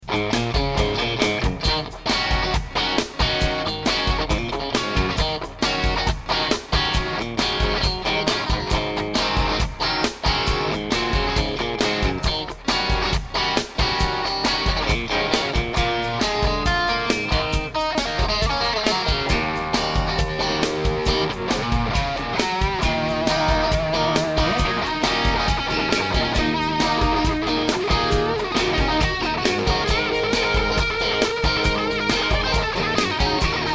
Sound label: Strat Tubescreamer